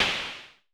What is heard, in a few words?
SN Low Punch, Vermona Mono15 Drums Snare